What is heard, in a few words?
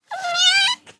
Kitten Meow; SES Animals SFX